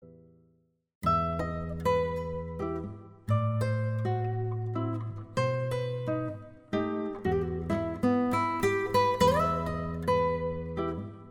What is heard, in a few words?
Guitar Mazurka; klasuchna gitara; Lishchinski